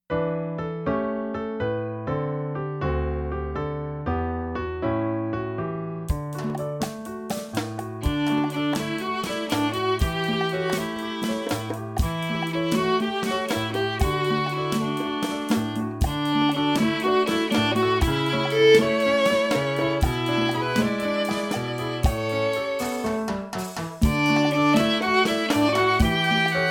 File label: Rumba numba, violin